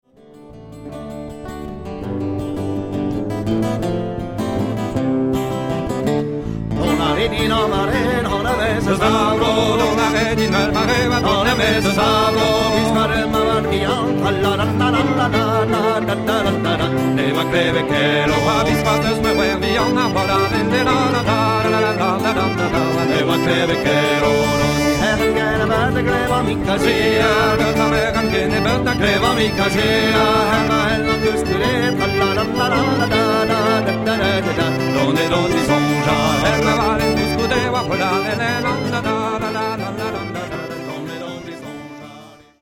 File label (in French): Gavotte ton double